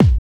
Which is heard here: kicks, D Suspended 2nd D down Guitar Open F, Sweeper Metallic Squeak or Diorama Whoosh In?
kicks